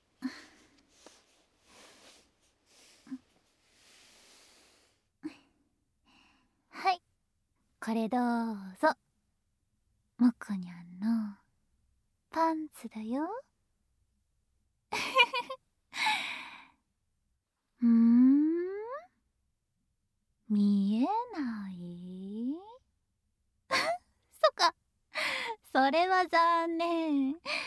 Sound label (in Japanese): 耳舐めパンツ手コキ, ASMR